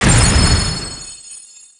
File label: magic; tower defense